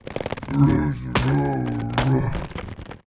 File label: BLAZE BLOCK PEACE IDLE